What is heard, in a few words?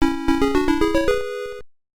COMPILE Tune [PSG]; Golvellius; MSX